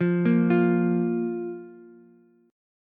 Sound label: A sharp 5th A sharp Ukulele; arpeggiated; JGuitar